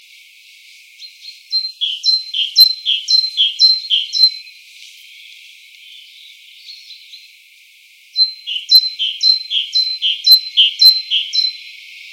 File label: Parus major song; passeriformes; paridae; birds of the ussr